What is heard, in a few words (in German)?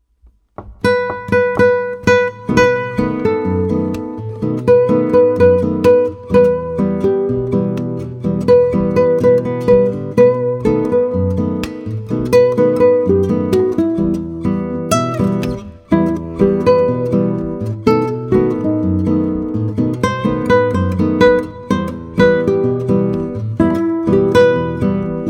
Gitarre